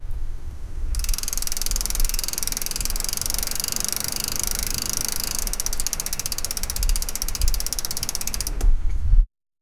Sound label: a bike turning